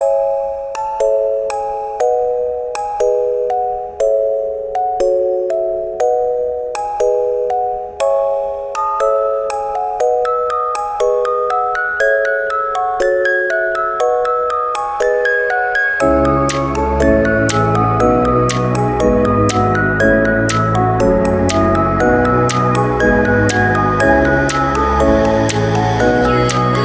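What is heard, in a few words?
ringtone music box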